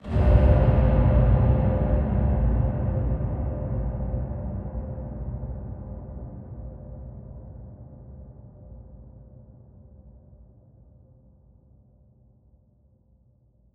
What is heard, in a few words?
Huge Verb Tail, Long Reverb Tails; impact samples